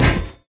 physics turret impact sm

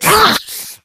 been shot, infected; Left 4 Dead 2; Source Engine

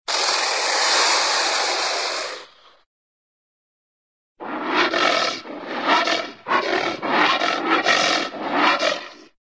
alligator alligator hiss, animal, reptile; cartoon

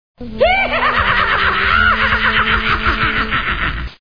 laugh; Source Engine

